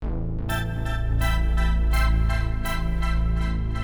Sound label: SYNTHPAD HOUSE 125 A; eJay; Sphere